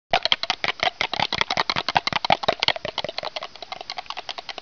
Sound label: click clack